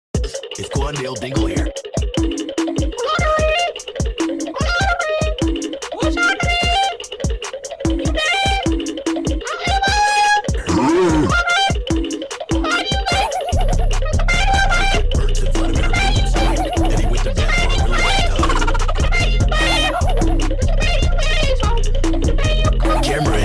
goofy ahh beat

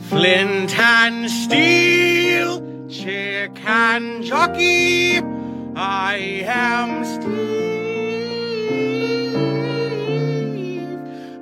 flint and steel song

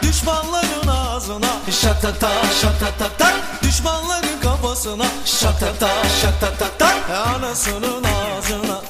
roman havası turkish

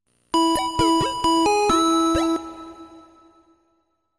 classic heal; pokemon

